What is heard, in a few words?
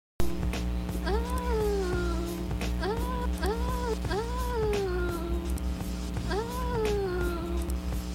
awww